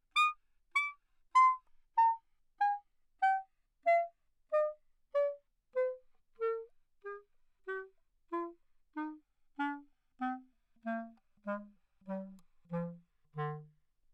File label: classical clarinet bb, Anechoic; OPENAIR